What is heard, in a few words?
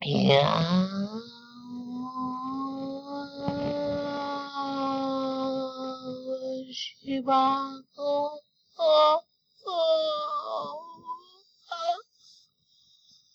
crying